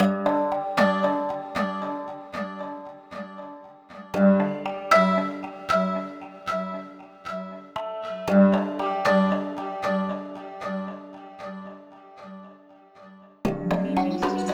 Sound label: song